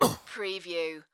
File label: Pain Umph, Male Painful Grunt, Hurt